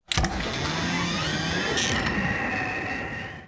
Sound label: pick N Click Scene Inn Door